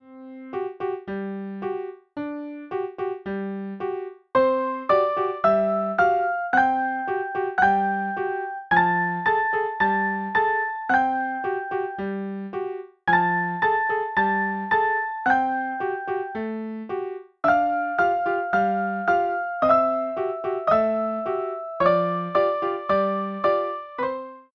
Piano Duet German folk song

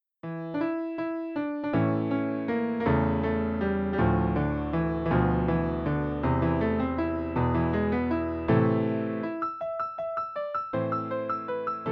Arranged for Piano Duet; La Campanella; Niccolo Paganini; Teacher Student; Dias Karimov

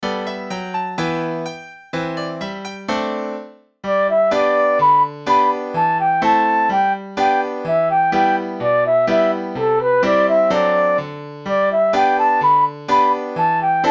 Flute and Piano

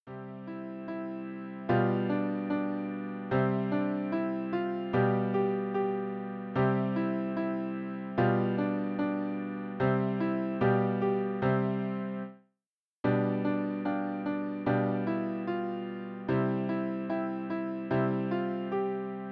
Haenschen klein German Folk Song

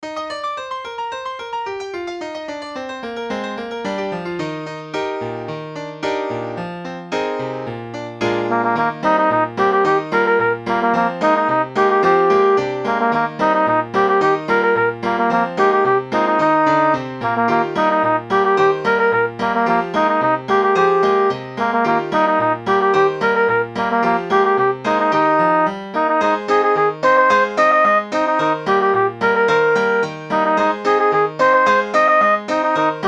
Trumpet and Piano

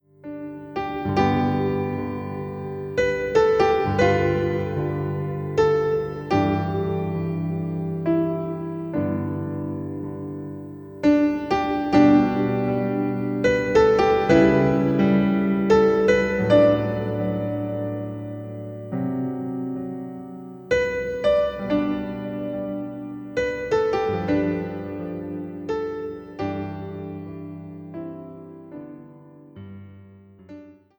Piano solo; folk; arranged for solo piano; Amazing Grace

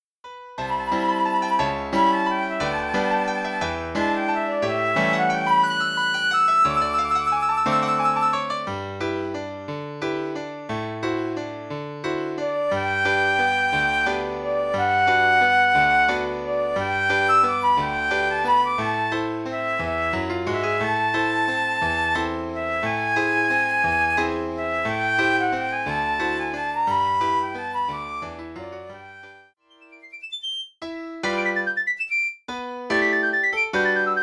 Flute and Piano

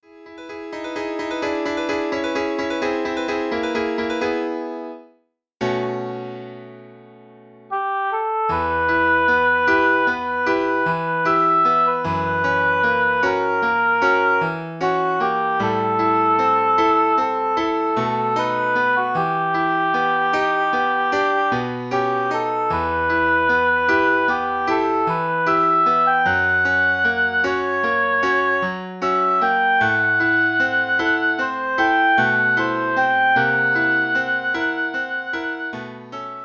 Lost Romance for Oboe and Piano

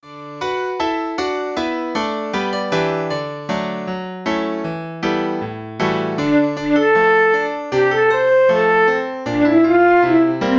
In the Sun Two Recorders and Piano

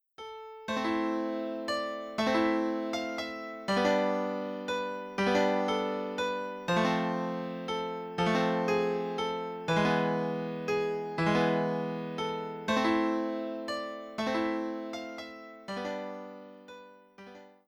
English folk song; Greensleeves

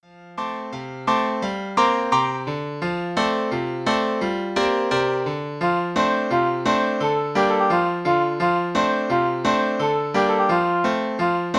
Playful for Oboe and Piano

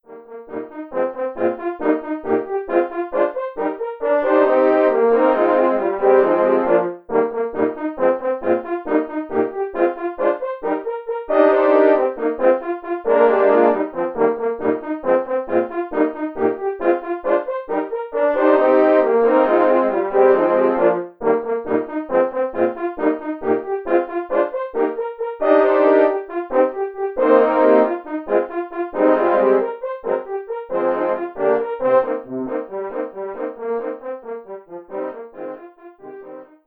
Four Horns; Scherzo

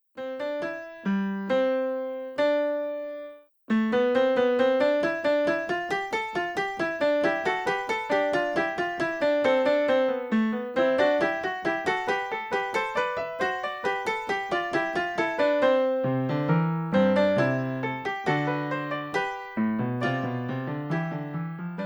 Piano Pieces

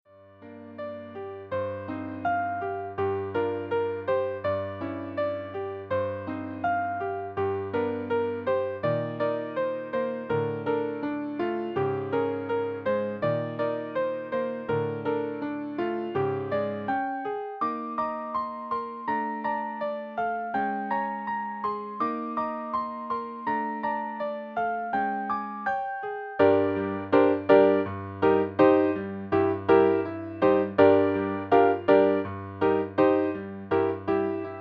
Romantic Fantasy for 2 Pianos; four-hands; Piano Duet

